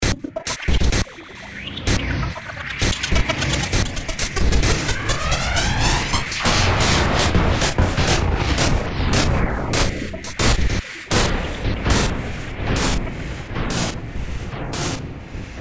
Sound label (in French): bidouille bruit